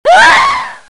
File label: death female; zbs